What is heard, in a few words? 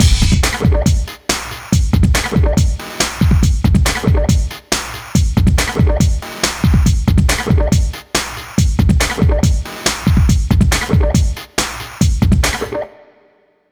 Hardcore Breakbeat, 140 Bmaj; Big Fish Audio; Hardcore Breakbeat Action; Goliath